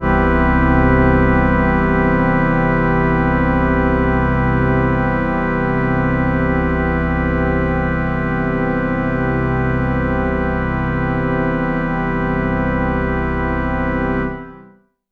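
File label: OB-6 organ major, synths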